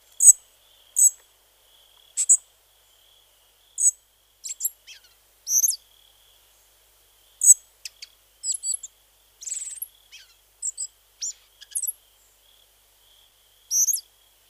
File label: Spotted Flycatcher song